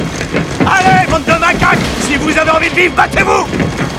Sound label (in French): bande de macaques